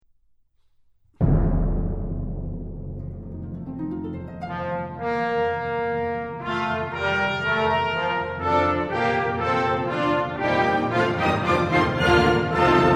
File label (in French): hymne slovenia